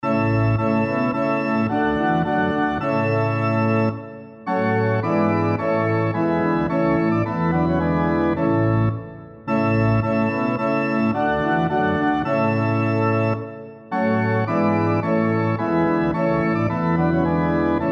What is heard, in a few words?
SATB on Piano Example